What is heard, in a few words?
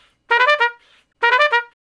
Trumpet Straight (E)